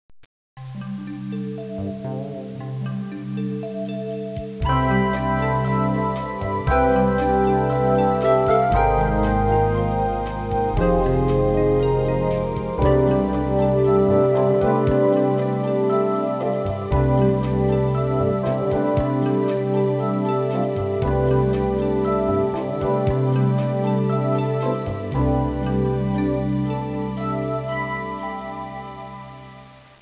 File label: new Age Rythm